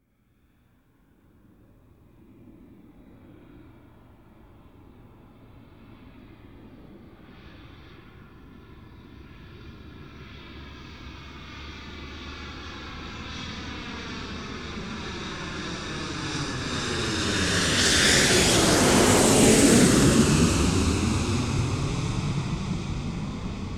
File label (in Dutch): Vliegtuig - 747 vliegt over; KMP Film Video Sound Effects